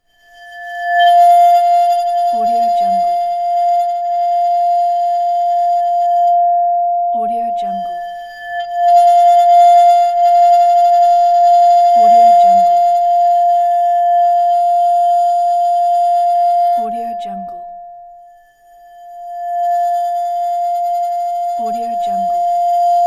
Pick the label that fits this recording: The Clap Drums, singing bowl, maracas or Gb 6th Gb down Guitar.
singing bowl